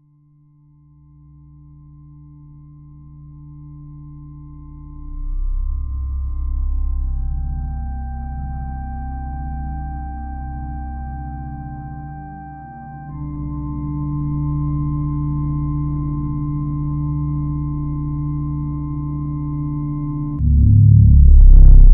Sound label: slow rise suspense music, drama